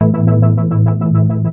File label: dice